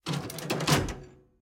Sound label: Turret